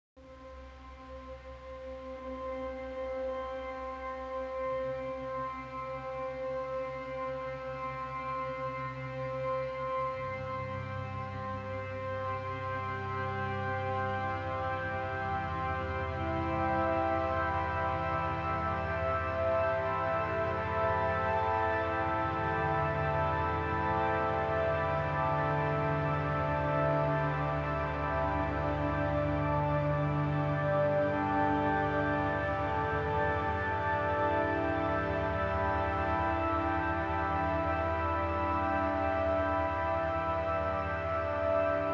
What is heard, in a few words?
stems